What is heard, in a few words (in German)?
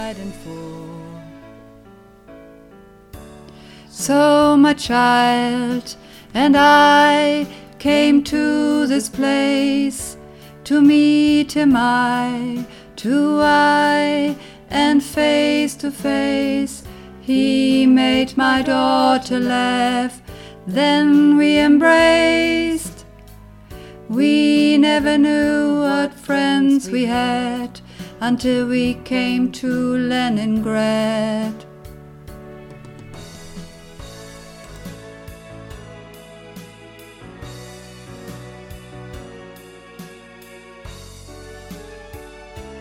Sopran und Alt Zusammen